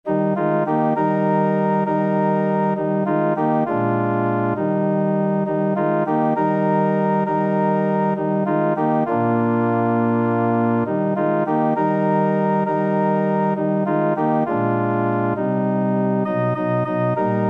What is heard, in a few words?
ORG, organ